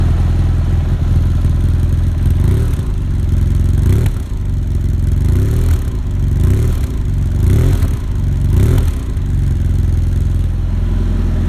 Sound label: F800GS Racing Sound